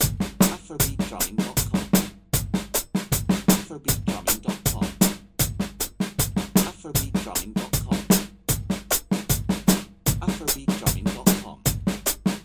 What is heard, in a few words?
Improving weak hand, afrobeat drumming